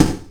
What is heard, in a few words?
Hit; Remember Me